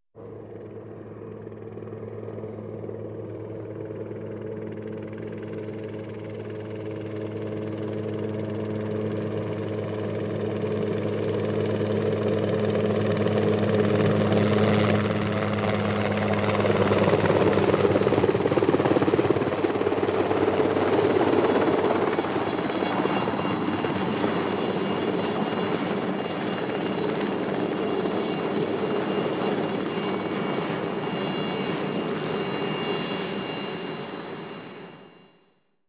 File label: Helicopter Approaching on Helipad